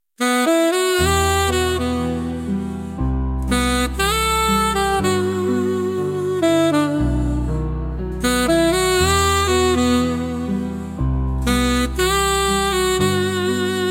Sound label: smooth sax journey